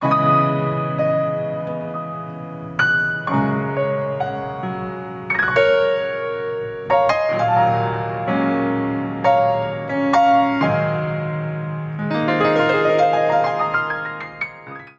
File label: gospel piano, ringtones; dustinwells